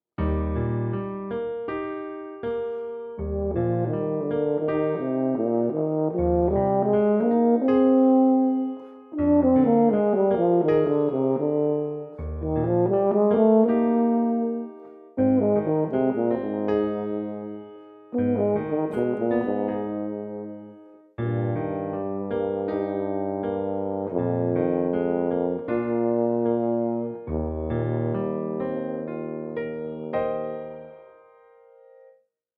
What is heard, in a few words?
Tuba